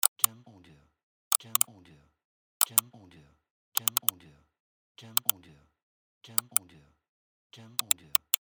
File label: Computer Mouse